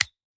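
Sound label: sounds of hair clipper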